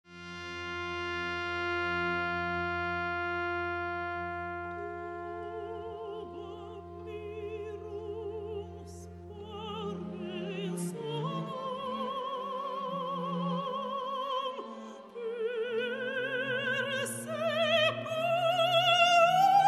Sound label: Requiem Tuba mirum; Balakauskas